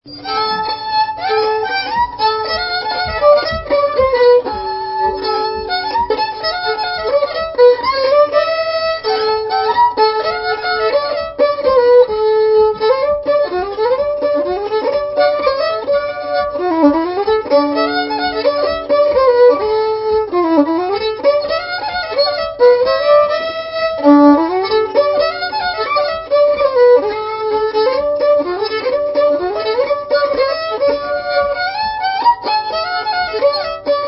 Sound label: Banjo Tramp, old time fiddle tunes